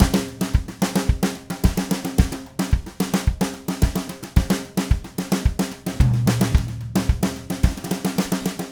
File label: Red Maple Groove 110, DW Red Maple Kit